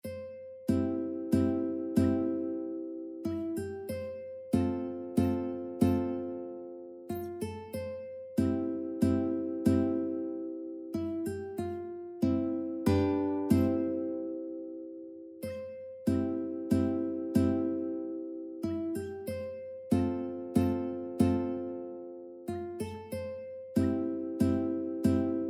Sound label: uke minuet; ukebook; nannerl